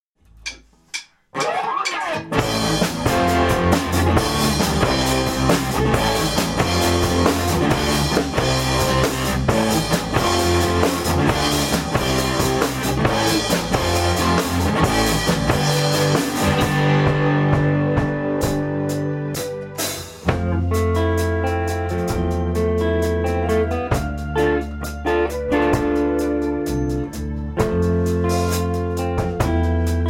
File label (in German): Instrumental